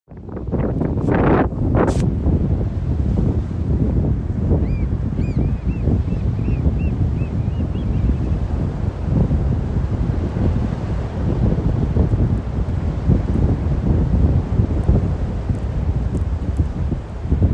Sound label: beach